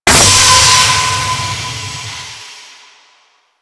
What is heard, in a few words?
Steam Heavy Burst; Warframe; Grineer Shipyards